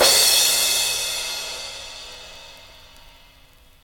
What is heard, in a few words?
crash single shot d key, Royality free cymbal crash sound tuned to the D note